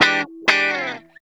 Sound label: GUITAR LICK; Ueberschall; Jam Box